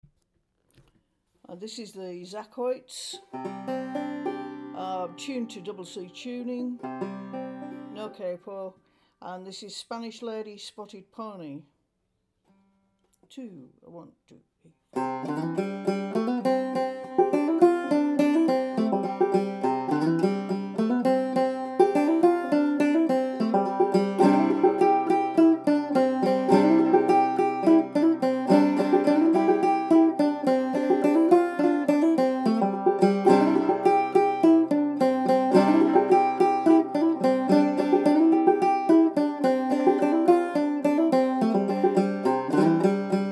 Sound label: banjo; Spanish Lady